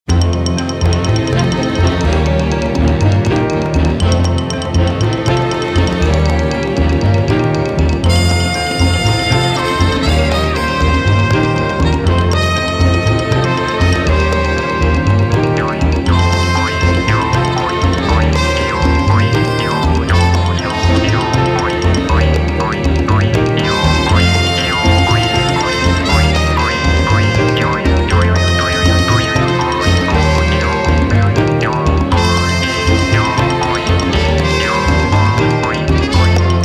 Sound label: Blues; Bus Wheel